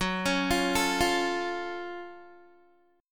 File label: Gb Minor Major 7th Gb, down, Guitar; arpeggiated